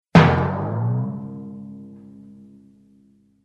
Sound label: Timpani